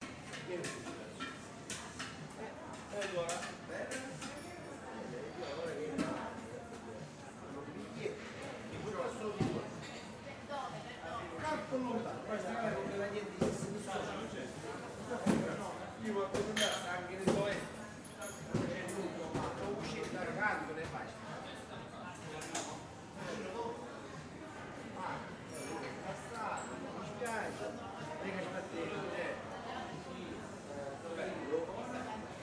Ambient Bar Bancone Bar Counter Sound People Talking South Italy; Fucsia